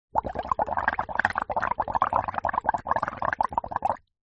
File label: nature water bubbles small shallow water plastic straw container; zapsplat